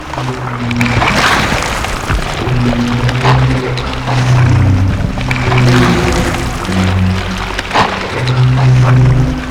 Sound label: JOVE projectile Loop